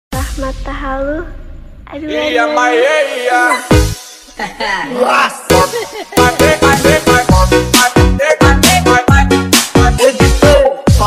Nhac Chuong Remix Nhac Thai lan

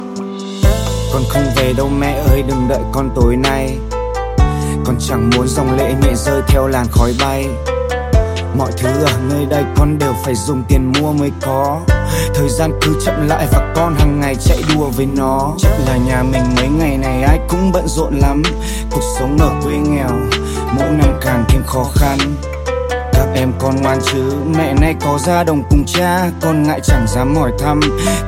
Nhac Chuong Rap; nhac tre

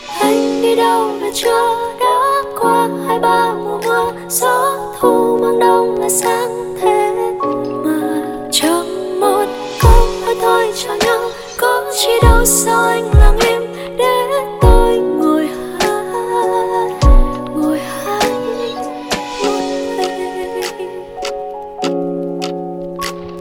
Nhac Chuong; nhac tre

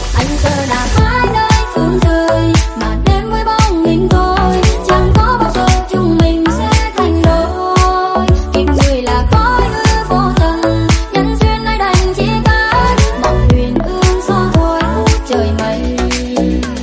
Nhac Chuong Remix

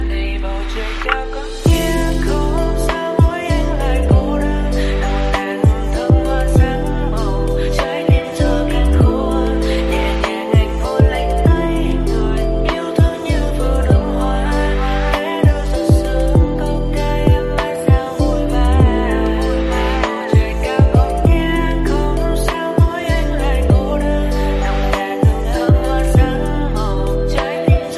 Nhac Chuong Lofi

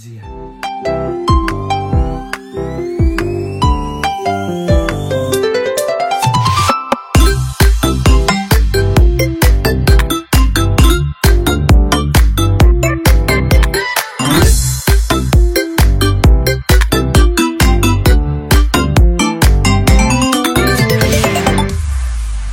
Nhac Chuong Bao Thuc Iphone Remix

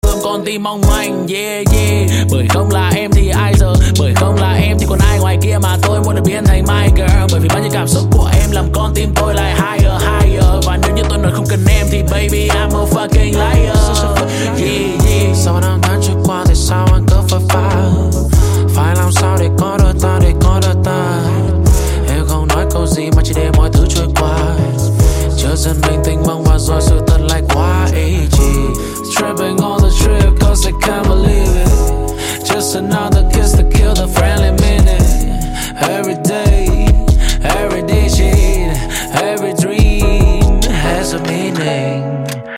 nhac rap

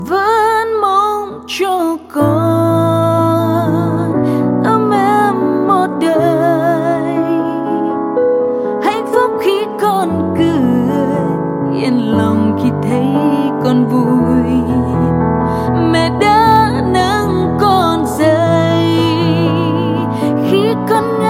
nhac tre

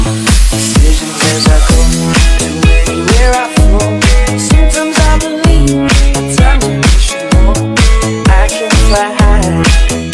Nhac Chuong Remix

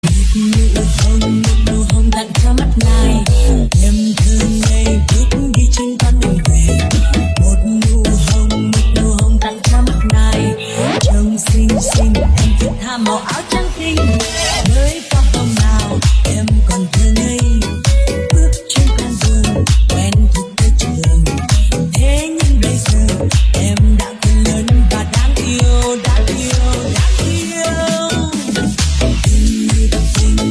nhac chuong cha cha cha remix

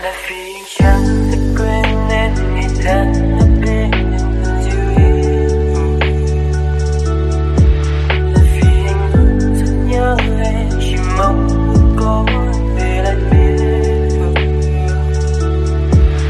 Nhac Chuong Lofi

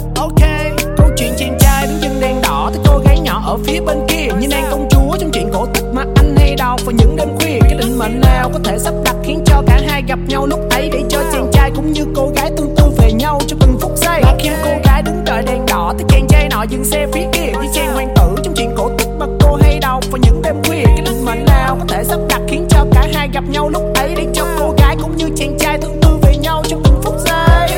Nhac Chuong Doan Rap; nhac tre

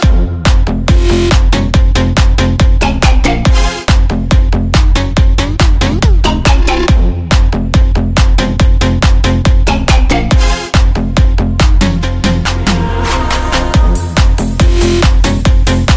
Nhac Chuong Nonstop Cuc Manh Full Bass

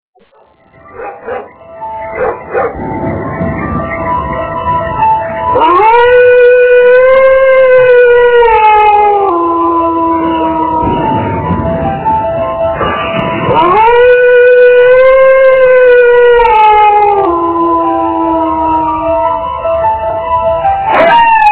Nhac chuong halloween kinh di dang so nhat

